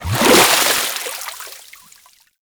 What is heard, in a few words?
Pirate Kraken Tentacle Water Splash; Warframe